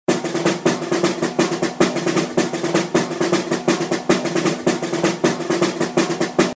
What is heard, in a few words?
caixa